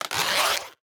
zipper

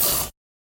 lightsword hits; soundscrate

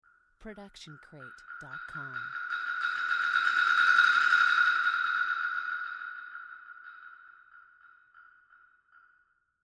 wood block accelerating rol

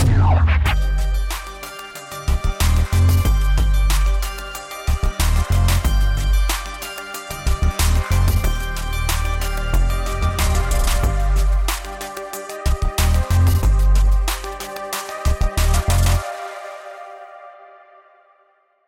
endcard tune; crates; soundscrate

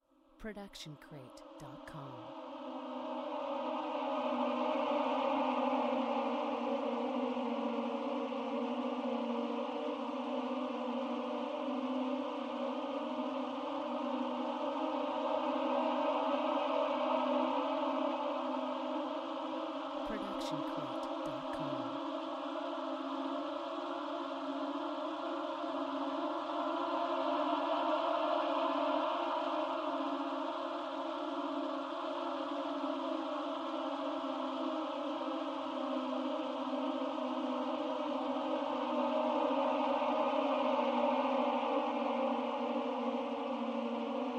Ambience Descending Rising

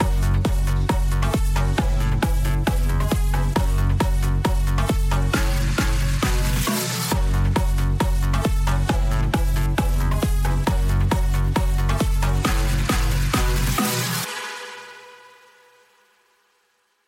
Logo Ident House Party; SoundsCrate

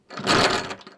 door handle shake; SoundsCrate

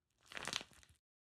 squishy short; soundscrate

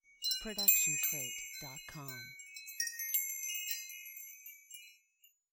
Wind Chimes B

